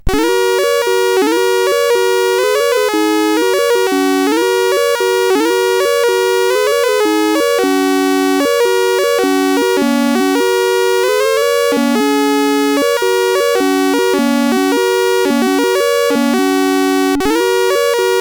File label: sid CSG8580R5, GAMES; Auto-Mania